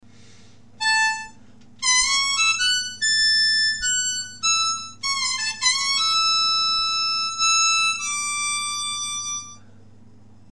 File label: solo